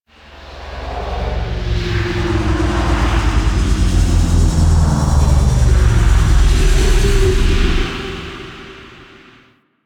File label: Ship Settle; Call Of The Tempestarii; Wraith Quest; Reaper On Bridge